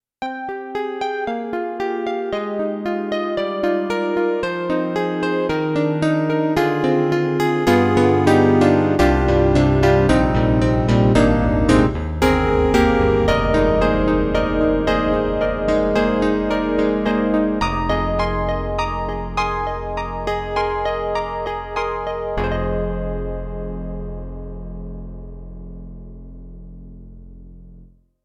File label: YAMAHA MU90 LayerCP1, ピアノ